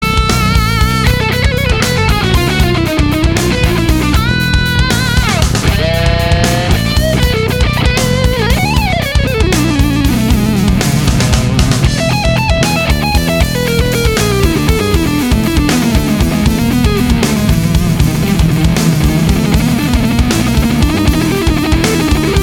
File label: Nasal Tone, Tube Amp; EL34; horrible nasal, 40 blankets over a speaker cabinet tone; Hoffman Amplifiers Tube Amp Forum; Warren Haynes lead sound